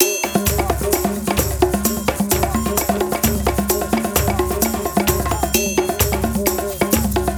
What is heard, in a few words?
Big perc; Latin (Латино)